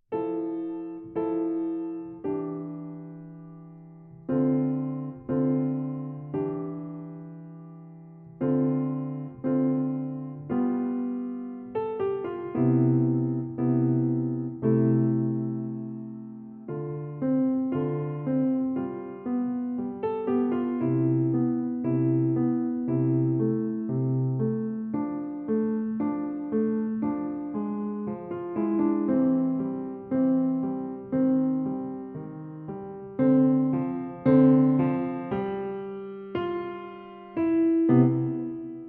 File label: Cool Junior Jazz